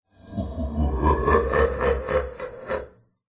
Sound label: horror demon devil evil laugh processed; zapsplat